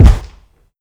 dope kick sound b key

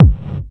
nice kick one shot c sharp key, steel kick drum

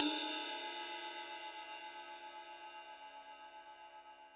ride cymbal sound sample b key

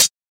hot closed hi hat sample D key, Loud; hat samples

